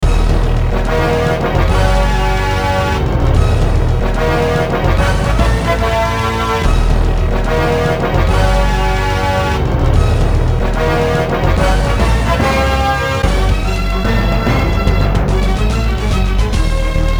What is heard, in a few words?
Multiball Madness, pinball music; Medieval Madness